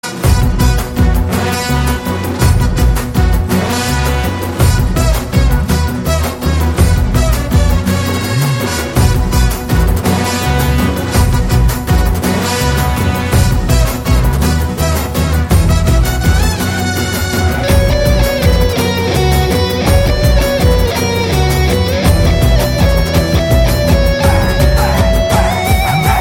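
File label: background music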